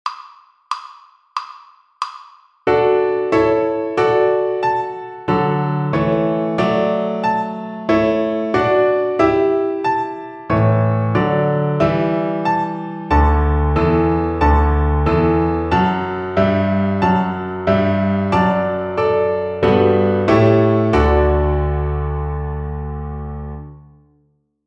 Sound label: String Gym, Piano Accompaniments; Etude 31 - Hooked Downs and Ups